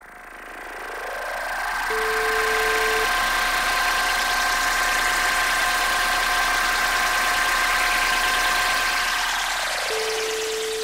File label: ufo